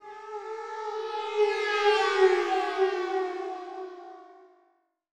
TECHNO FX 140

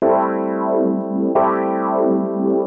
PIANO 90 A; Keys

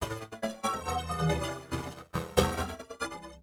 TEKNO 140; Techno2

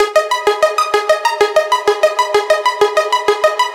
synth 128 G#; Electro; Anthemic; synth loops

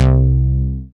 BASS MONO

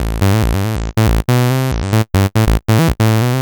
TECHNO SYNTH 140; Keys

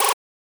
Glitch, One Shots, Glitch FX; Touch Loops; CosmicFunk

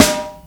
HOLZSNARE, snare drum; SNAREDRUMS

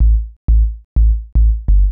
HOUSE BASS 125 A

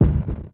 One Shot, Hits

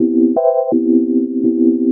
house synth 125 A, keys

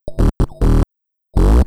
TECHNO BASS 140 A

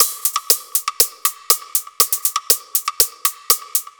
120 Perc Drums; Loops; Percussion; Touch Loops; CosmicFunk

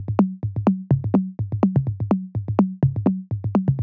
125 Grooves, Percussion; Touch Loops; ElementsOne; AbstractRhythms

